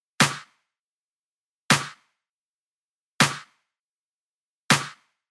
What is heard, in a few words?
Clap